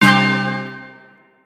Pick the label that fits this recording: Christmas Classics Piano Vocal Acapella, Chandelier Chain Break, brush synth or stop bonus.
stop bonus